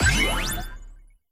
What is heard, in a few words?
collect; collected deposit/kc coin